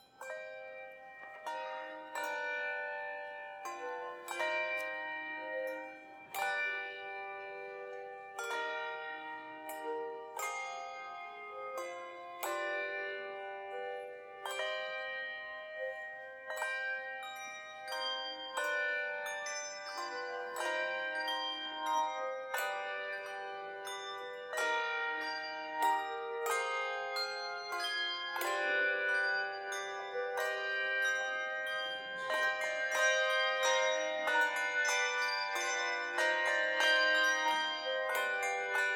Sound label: Communion, Carillons